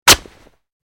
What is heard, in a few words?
Bullet Tears Vest